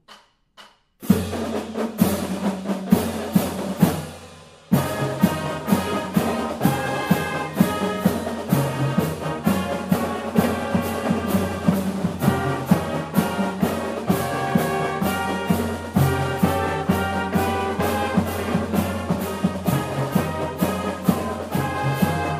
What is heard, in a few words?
Fight Song, marching band; hs marching band stands music and warmups